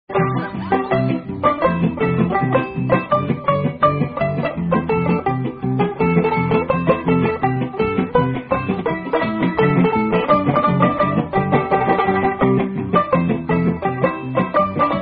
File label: Banjo Medley